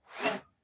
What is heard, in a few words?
whoosh whistle soft; swooshes-swishes